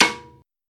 old school subtle reverb acoustic snare sample a sharp key, Royality free s